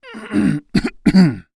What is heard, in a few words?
Human Male Middle Age Clear Throat